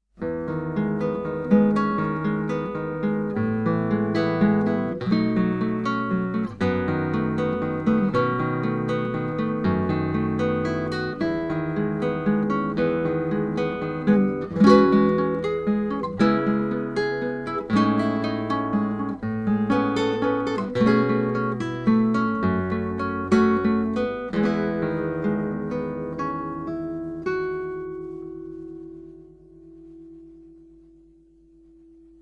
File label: jazz soft